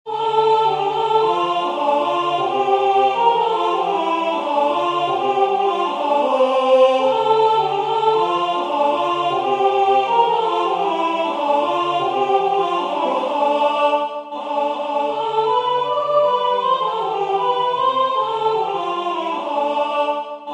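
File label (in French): femme, Musique sacrée; Stella splendens Livre Vermeil de montserrat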